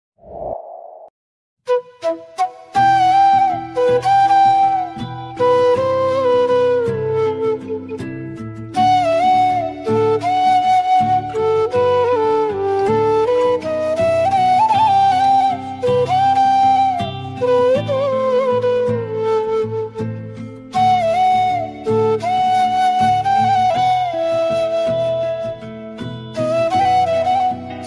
remix ringtone, romantic song flute ringtone

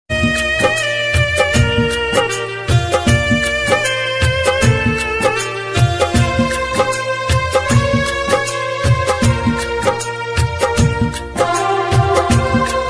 organ ringtone; pyar ka bandhan; raghunandan

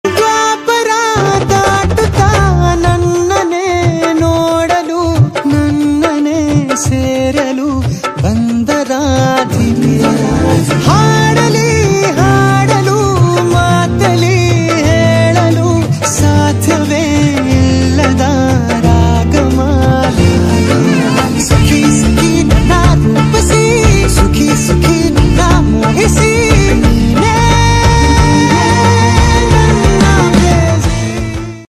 kannada song ringtone, bgm ringtone; krishnam pranaya sakhi movie

